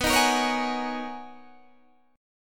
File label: B Major 13th B down Guitar DADGAD